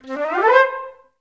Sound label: FL RUPI C3; Best Service; Peter Siedlaczek's Advanced Orchestra